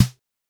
Snare, Fricke MFB 502

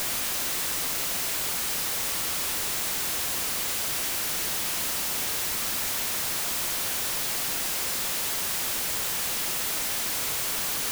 Noise White, LoFi Noise; Roland BK-5

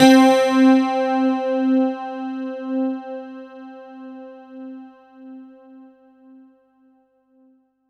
C4, Synth Line; 90 s Sample CDs; NiceBeats; SynthLine VOL-11

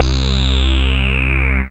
bass C, Acid Bass, Dance Synths

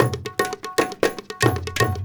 India, Heart of Asia

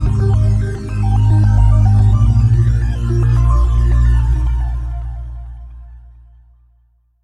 Pulsating Pad; Pads; Dr. Fink's Funk Factory

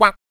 KUK, MALE PERC

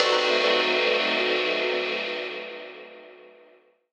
RAW RIDE; Rawcutz; Nu Forms

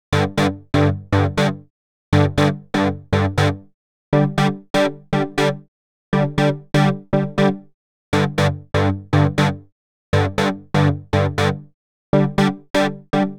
Deep Chords, Deep Acid; Sonic Foundry (Sony Creative Software); Afterhours EDM

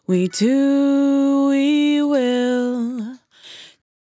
100bpm Bm, Velvet Vocal; Zero-G

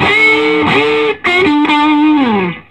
SOLO, Vintage Blues Guitar; SOLO D060; AKAI; USB Soundscan vol.22

